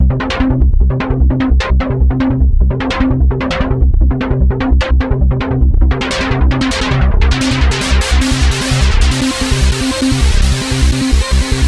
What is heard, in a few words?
LOOP 149, SQ LOOPS; Masterbits - Tekkno Trax; XXL SQ LOOPS